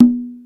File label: Misc Conga; Mo' Phatt; Digital Sound Factory Mo' Phatt